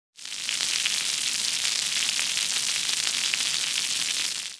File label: Rain Cloud Rain; emotes